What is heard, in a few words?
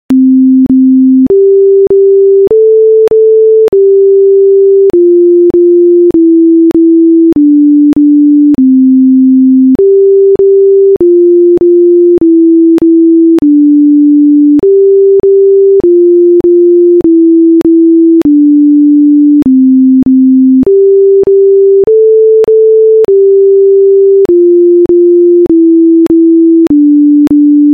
twinkle twinkle little star